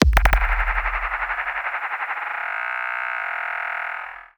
sci-fi buzz

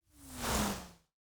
Swoosh Transition

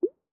Mouth Blip

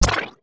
Sci-fi Pulse Gun Blast

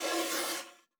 Soft Slide Medium

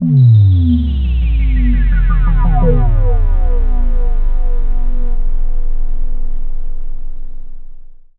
Sci-fi Bass Drop

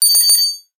Multiple UI Beeps

